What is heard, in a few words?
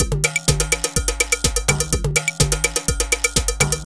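drum loops, right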